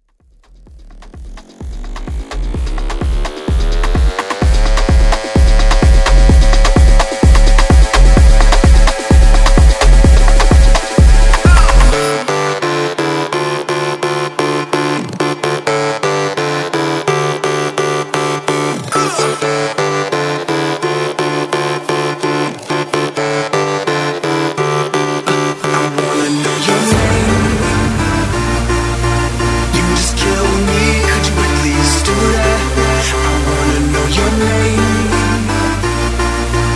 Afro Vocal

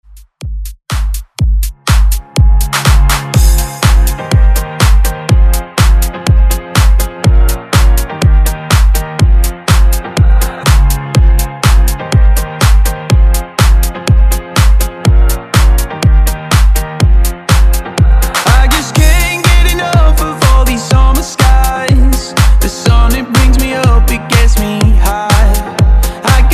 House Redrum

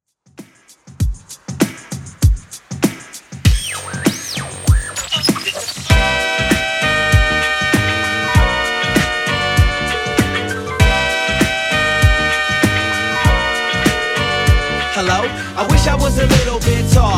90s Redrum 98bpm

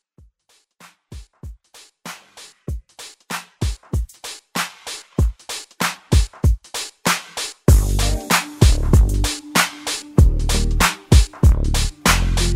Redrum

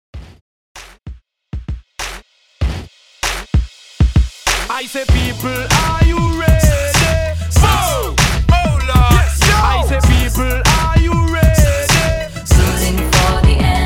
Party Break 97 BPM

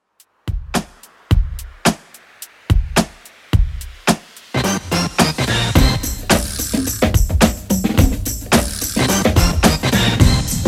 90s Old School Redrum 108bpm

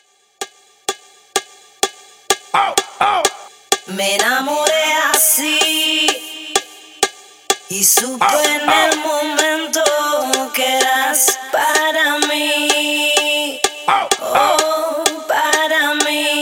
Hype House 127Bpm

